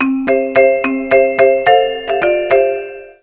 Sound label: Alert; Samsung; SGH-i300